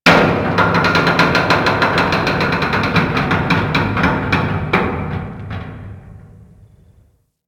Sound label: Derelict Metal Creak Slow; Warframe; Orokin Derelict